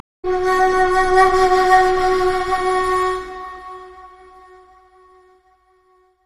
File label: tidalwave flute; nami koi